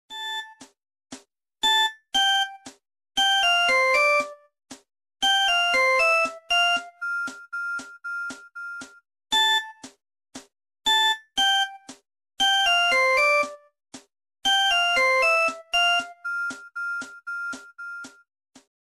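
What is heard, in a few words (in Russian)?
eksklyuzivnij zvuk, ringtone; samsung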